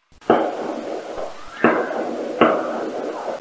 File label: scream between gunshots; This short extract contains gunshots that / has a scream between gunshots.